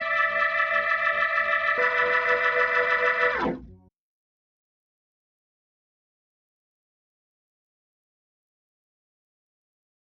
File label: EQ organ